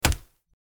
dirt, bullet hit; metro

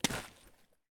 concrete footstep

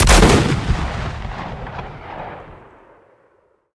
preved shoot, weapon

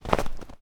snow footstep

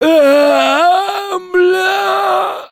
death, Human

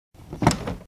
wooden Crate Open

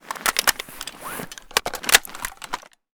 ak2012 unload, weapon

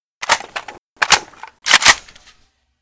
ak unload, weapon, rpk; metro

